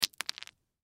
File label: Ice Drop, Ice Drop Cement; Food; Household; Blastwave